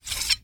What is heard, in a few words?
medieval dagger draw from sheath